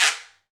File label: guiro, wood; Club-50 - Foundations Roland